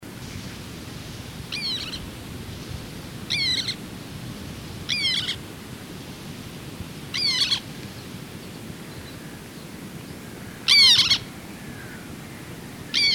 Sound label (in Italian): Mignattino comune